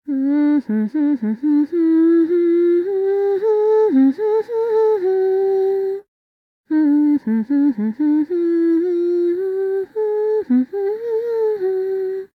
Voice song, en

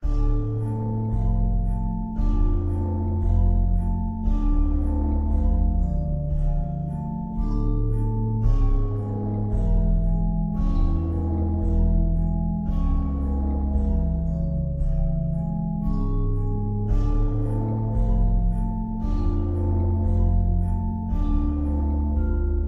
Music Butler

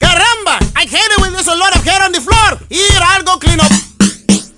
Entering Cool Mode

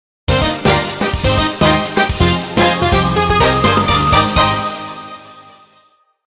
Champion Jingle Theme

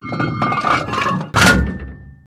Tank Reload

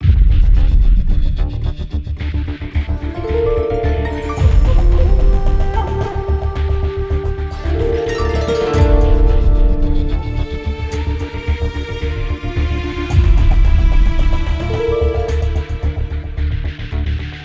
Jingles; SW Jingles Statscreen; Shadow Warrior 2 gamerip 2016

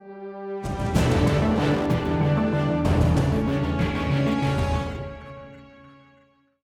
190bpm

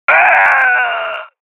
T Death

